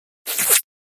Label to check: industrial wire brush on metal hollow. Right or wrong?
Wrong